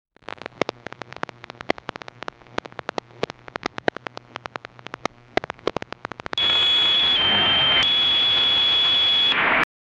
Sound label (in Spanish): efecto de radio antigua, radio tuning